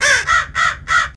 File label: Crows Call to Each Other